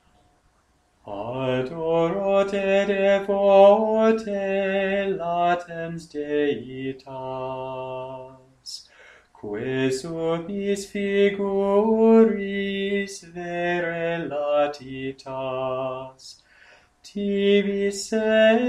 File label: Adoro Te Devote Latin, chant